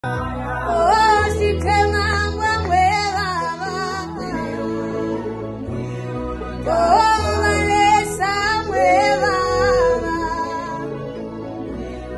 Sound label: Emotional sound